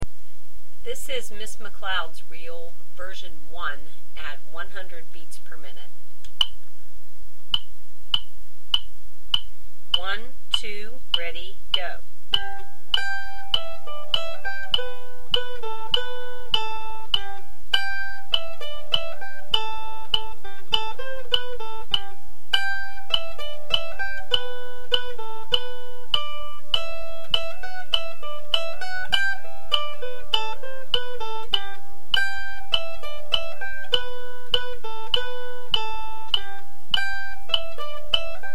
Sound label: Reel 100bpm, mandolin